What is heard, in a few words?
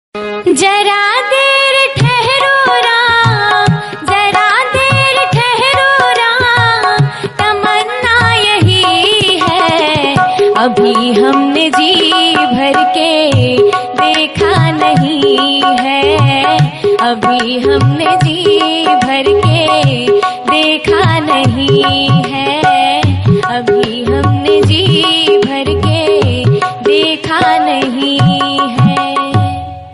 HINDI RINGTONE